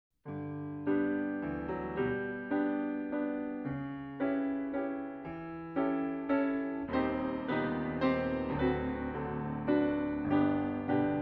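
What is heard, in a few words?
piano accompaniment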